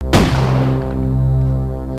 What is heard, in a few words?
death; singe